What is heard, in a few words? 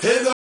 Vocal